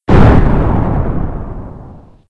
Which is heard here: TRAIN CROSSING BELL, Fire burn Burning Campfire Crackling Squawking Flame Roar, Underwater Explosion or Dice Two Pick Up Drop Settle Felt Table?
Underwater Explosion